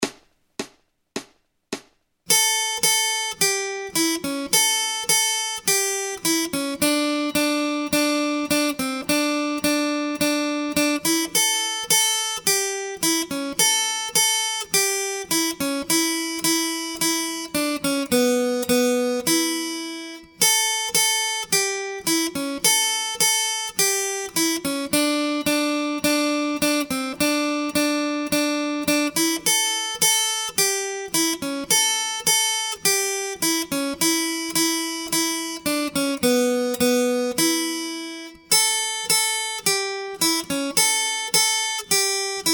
Acoustic Accompaniment, acoustic guitar

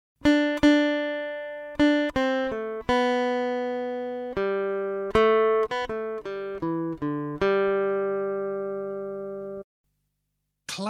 Aural Rhythm Test, acoustic guitar; Grade 5

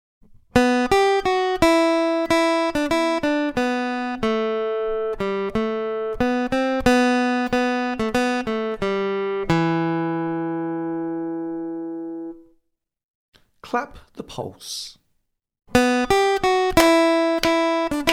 Aural Rhythm Test, acoustic guitar; Grade 6

